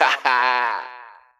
ha ha, ooh ahh; Voice

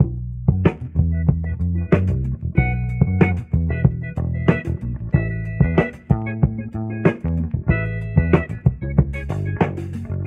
guitar, Loops

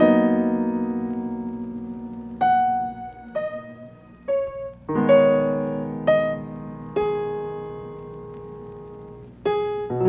piano, sad loop

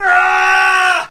scream

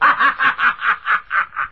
laugh satan

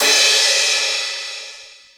Cymbals

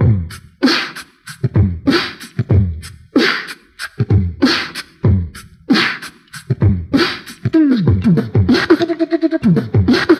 Beatbox, Drum loops

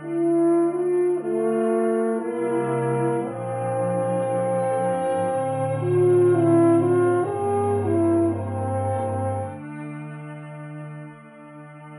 horns defeated, Sad; Loops

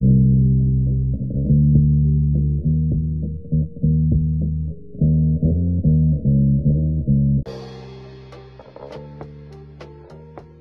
bass nice one, loops; Phonky

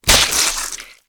bone break amputate

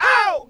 "oh", group of men

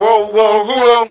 "WOAH WOAH WE WOAH"; ooh ahh

